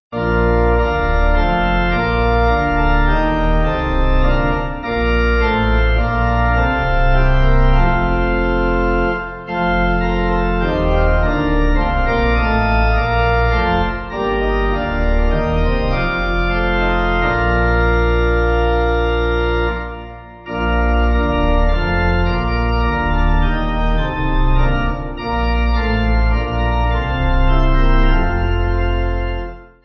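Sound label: Pipe, hymn backing; Im Not Ashamed; Tiverton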